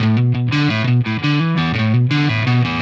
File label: Static Guitar; Sonic Foundry (Sony Creative Software); Indie Rock Anthems; Session 13 - Static